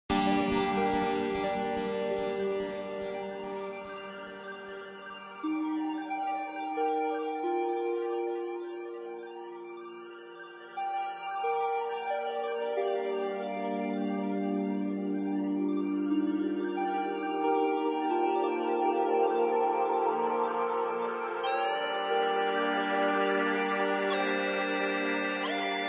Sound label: going under; super stickman golf 3